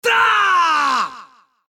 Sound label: attack; Bad Brawler